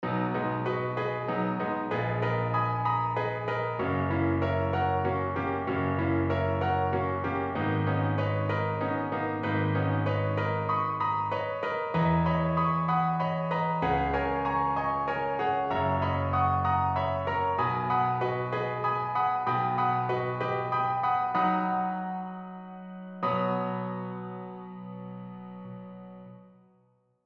Preludes No.6 in B

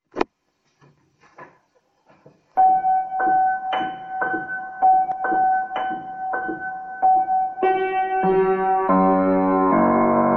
etude for left hand

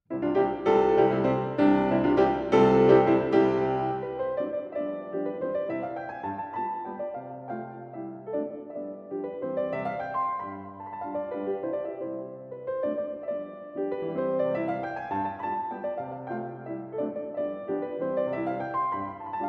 Mazurka in C major; Op.68 No.1

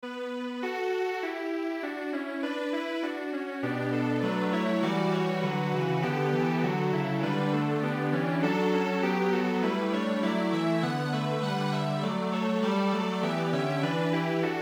Canon

